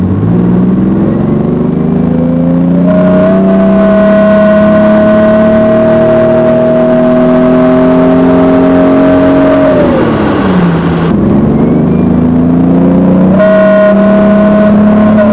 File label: death whine